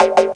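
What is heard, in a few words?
Ragga Noise, Percussion Hits; Zero-G Jungle Warfare 1, 2 & 3; 90 s Sample CDs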